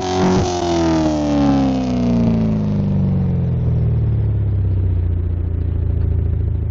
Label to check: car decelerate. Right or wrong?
Right